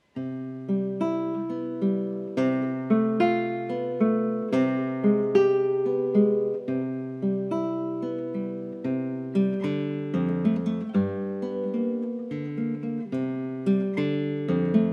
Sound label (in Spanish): Estudios Sincillos